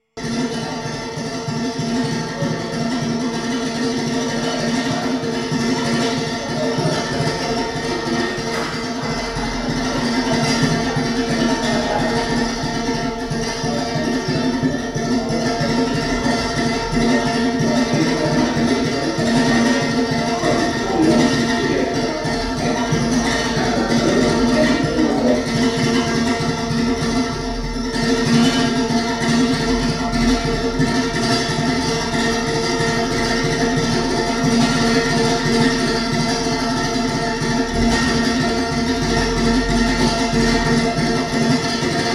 A Doubel Bow Extract From A Non-Stop 12 Hour Marathon Solo Violin Concert